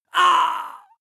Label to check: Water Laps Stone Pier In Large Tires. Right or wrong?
Wrong